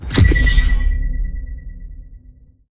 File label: Map Hack Radar Pulse; Burn Card; Titanfall 2